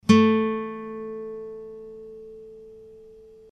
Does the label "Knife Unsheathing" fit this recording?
No